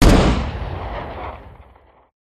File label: gunstand machinegun fire; gow; turret judgement